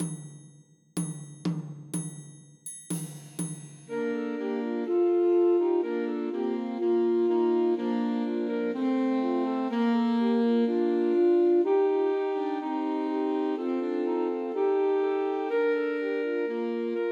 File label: Joy to the World Christmas Trios for Three Alto Saxophones